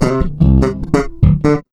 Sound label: BASS, Big Beat; Big Beat Drumloops; USB Soundscan vol.25; AKAI